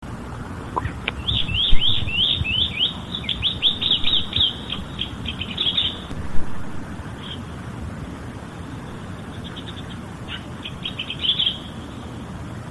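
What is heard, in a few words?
chestnut-cheeked starling; komukudori; nishikigaoka koukou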